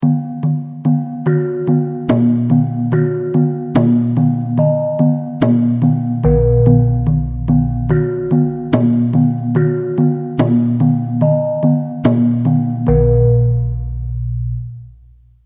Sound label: kenong kempul, gamelan